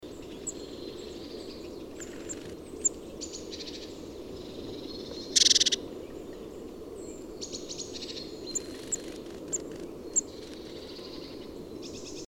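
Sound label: Eurasian blue tit caeruleus flight call; darsswald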